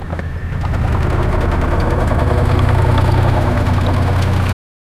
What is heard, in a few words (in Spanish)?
bote pesquero de madera, boat